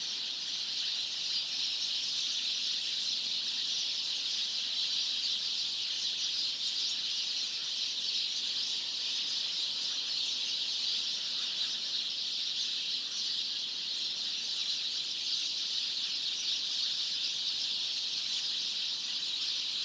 loud morning tweets

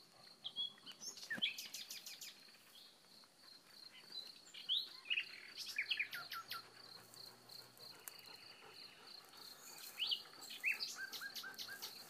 nightingale tweeting to its neighbours

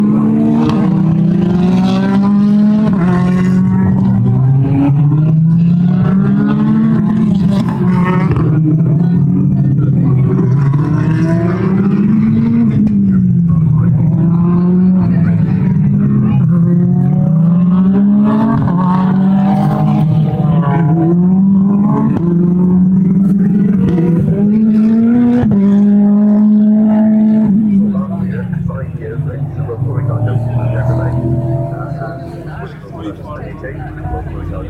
thruxton british touring car championship

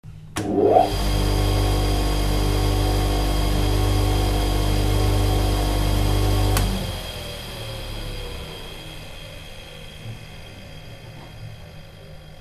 polishing machine in jewellery studio; robin shelton s

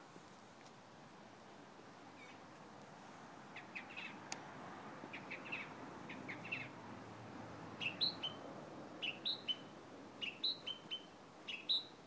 birdsong sunday morning, christchurch

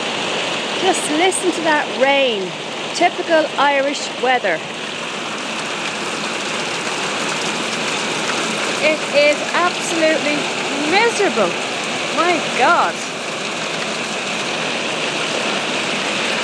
irish weather at its best

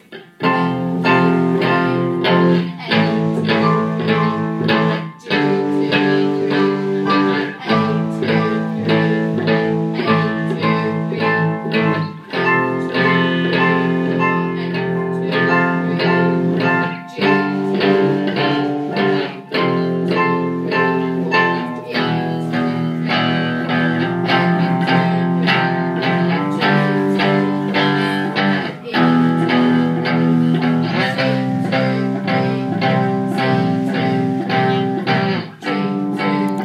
chord sequence example; year 9

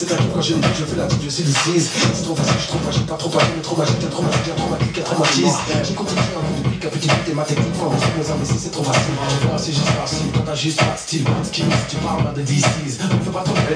french hip hop electro; last night in paris cheese red wine

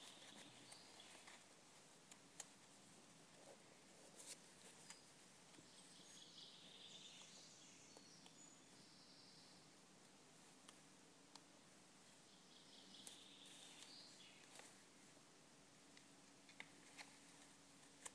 inside church; threxton

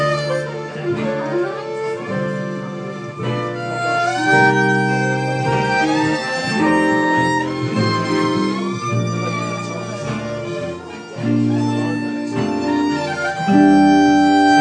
more fiddle really sings beautifully here

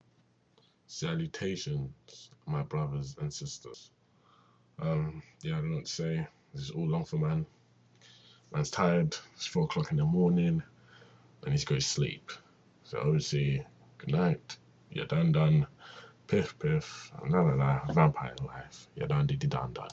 ya dun dun

